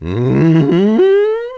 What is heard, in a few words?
Hmmm; Tingle